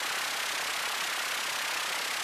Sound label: spin